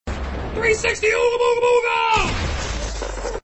360 meme sound effect

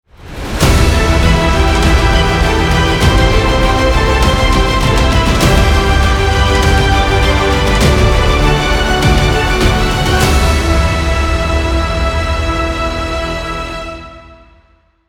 epicness trailer

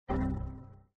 error meme sound effect

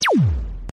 laser shot

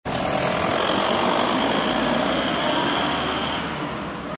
car driving away

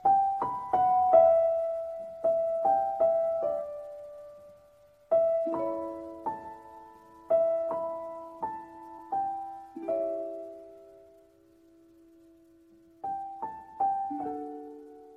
sad piano tune

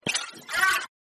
slap

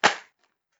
fish dropped hit

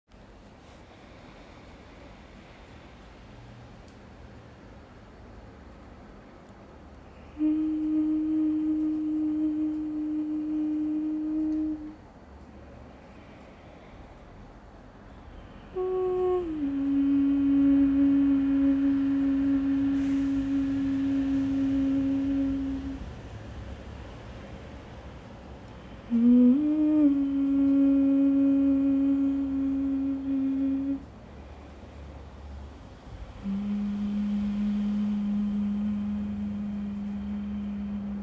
humming

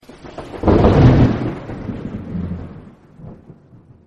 shocks

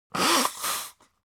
persons nose blowing

